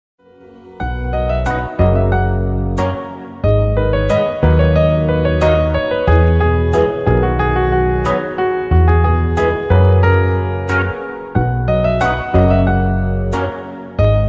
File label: english instrumental ringtone